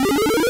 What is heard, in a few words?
Item Pop; NSMB